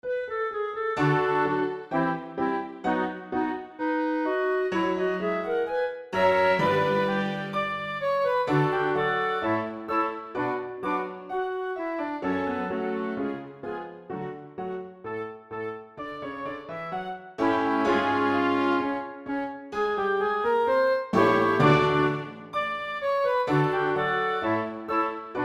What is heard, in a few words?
gavotte